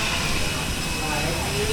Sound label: External Jet